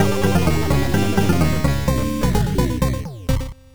VS Draw; bomberman 93; turbografx 16; pc engine

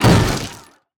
Loop Impact; Necromancer Skill1; Portal Quest

